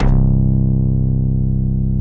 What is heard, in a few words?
SMACK BASS; BASS SOUNDS